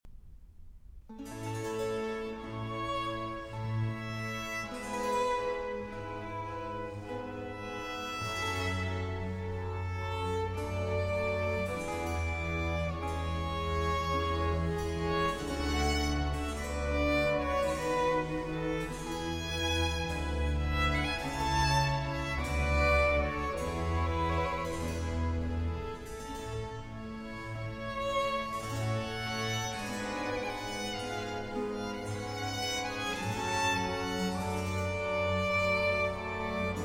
Violin Concerto in B Flat Major; 12 Violin Concertos, Op. 4 - La Stravaganza - Concerto No. 1; RV 383a

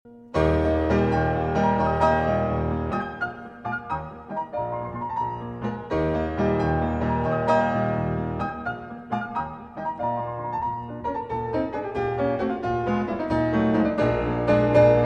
Piano Concerto in E-Flat Major, Rondo. Allegro; Beethoven; No. 5; Op. 73 Emperor III.

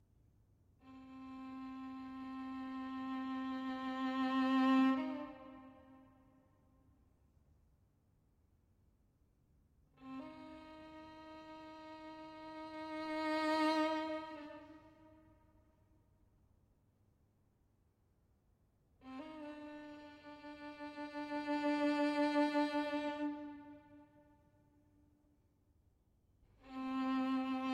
Suite For Violin & Piano II. Horizon

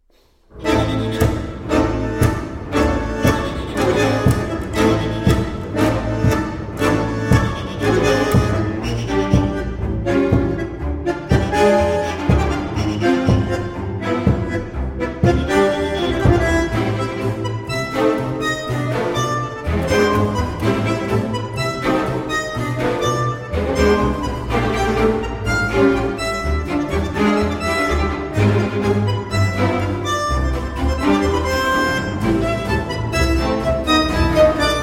bandoneon, violin and orchestra

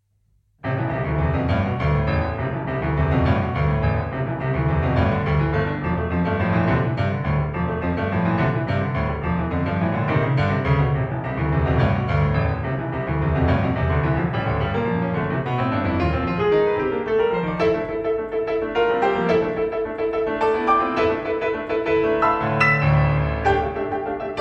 Piano Sonata, Ruvido ed Ostinato

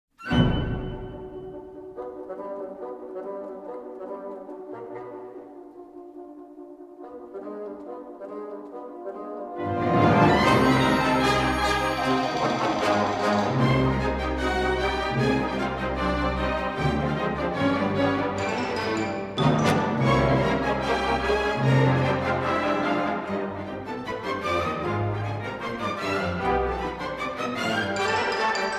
Orchestra; The Sentimental Bloke Rose and Ginger Mick’s Stoush

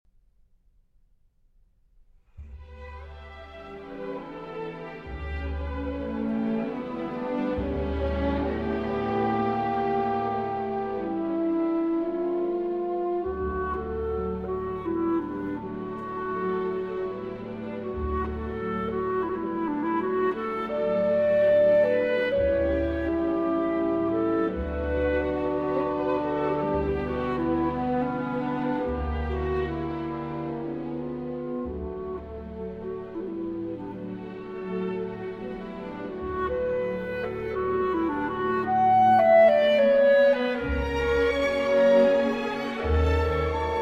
Violin & Piano; Beach; Op. 40; Berceuse

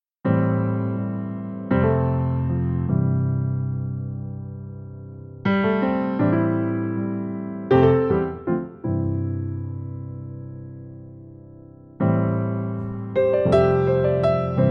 Piano Version

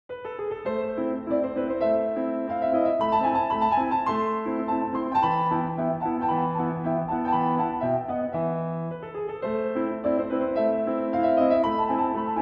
Piano Sonata No. 11 in A Major, Rondo Alla Turca

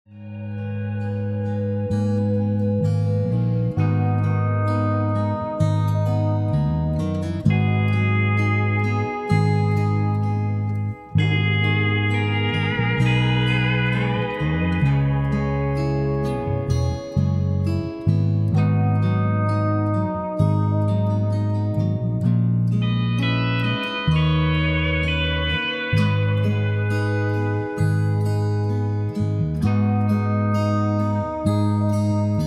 Piano Instrumental

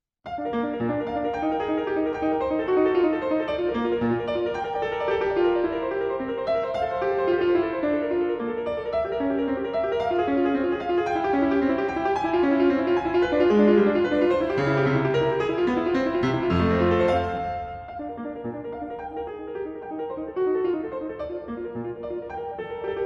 Keyboard Partita No. 1 in B-Flat Gigue; BWV 825

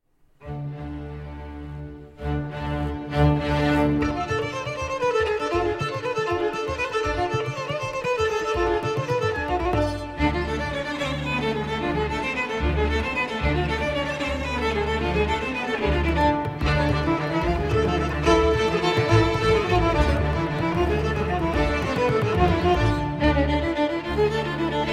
Solo Violin, Solo Lyra and Ensemble; Daly; Makrinitsa

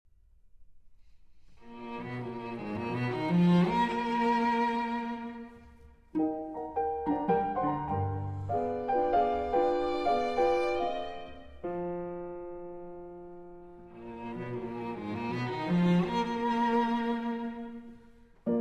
Piano Trio; G Minor; II. Vite

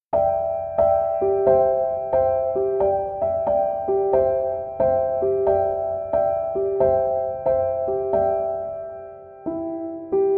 Piano Instrumental

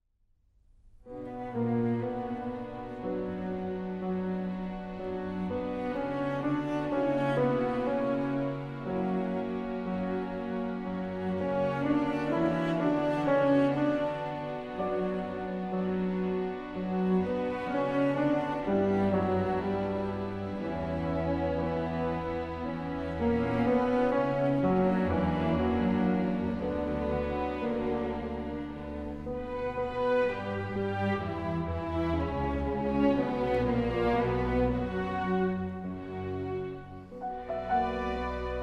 Keyboard Concerto In D Major; BWV 1054

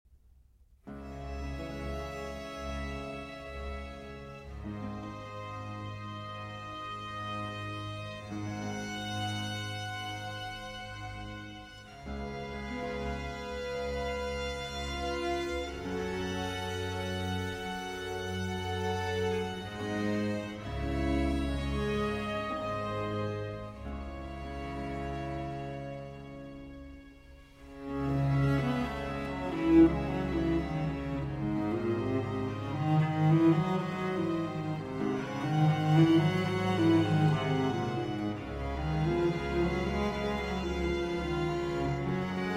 Cello Concerto in F Major, Larghet; Vivaldi; RV412; 2. Larghetto

